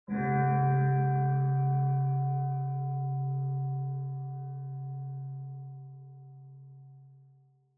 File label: Bonsho Far Low